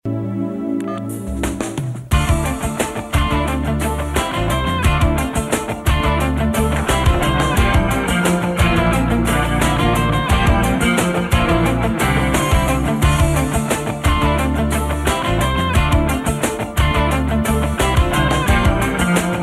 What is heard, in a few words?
russian song